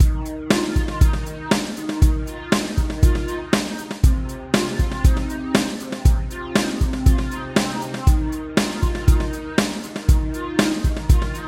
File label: beat synth